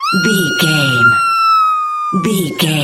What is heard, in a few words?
voice female scream